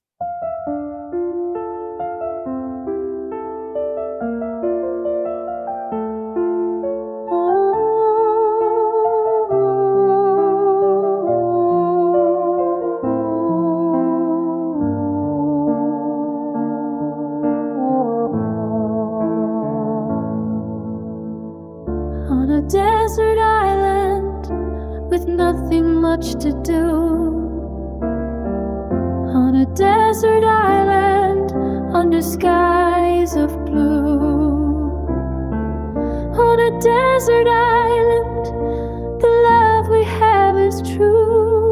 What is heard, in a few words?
female